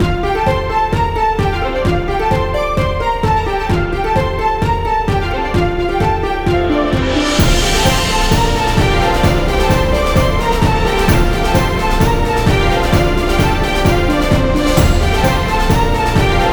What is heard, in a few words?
Celtic Festival (No Choir); Shockwave Sound - Fantasy Worlds Vol II+III